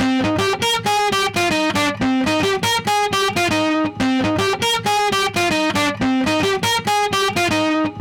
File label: Acoustic Guitar; Antelope ZENQ; Plexi59